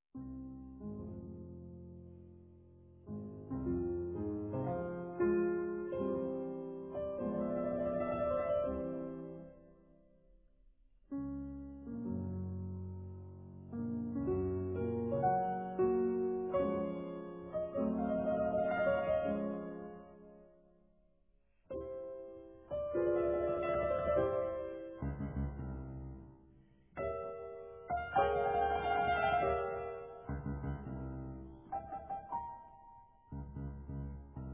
piano recital, Ludwig van Beethoven Sonata in F minor; JANACEK BEETHOVEN SMETANA; Appassionata Op 57